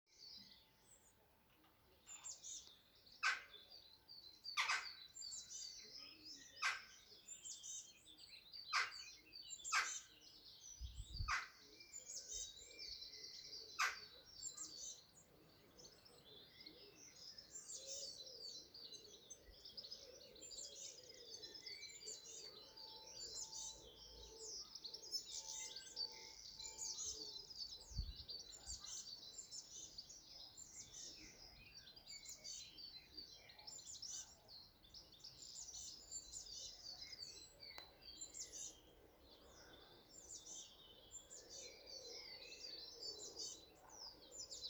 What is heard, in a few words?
Birdsong